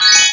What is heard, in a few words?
pickup; FinalOdyssey